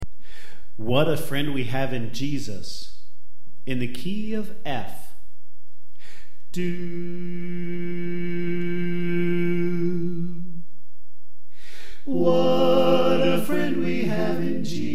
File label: Soprano Left